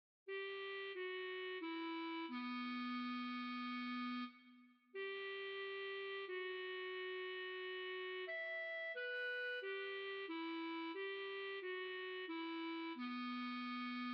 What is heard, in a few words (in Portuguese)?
clarinete